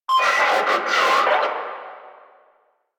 Exalted Sniper Cast Vocal; Warframe; Frumentarius

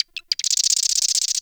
Forest Bird Squak; Warframe; Grineer Forest

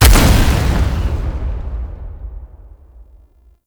Mimic Explode; Gameplay; Warframe; Nineteeen Ninety Nine; PvPvE

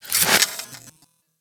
Micro Jump; Warframe; Corpus; Arachnoid Micro; Abilities

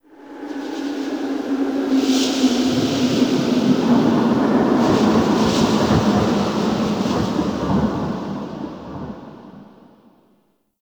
Exterior Empyrean Gust, Ambience; Warframe; Railjack

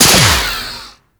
Mech; Warframe; Weapons; Strun Prime

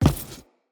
Crew Footstep Default; Railjack Crew; Steel Meridian

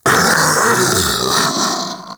Vocal Death, Infested; Splitter Split; Grey Strain

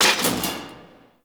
Rail Jack Missile Launcher Mech A; Warframe; Vehicles; RailJack Weapons

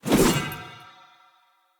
Long Sword Throw Perfect; Weapons; Player; Pothia Long Sword; Swords

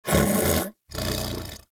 Pet Snore; Warframe; Catbrow; PetShip

